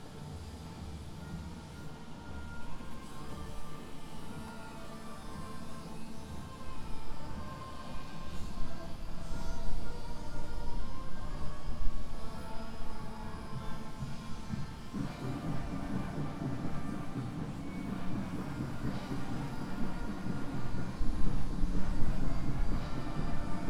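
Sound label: koteki shinhama, Fukushima Soundscape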